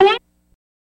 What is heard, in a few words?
Bonk Pseudo String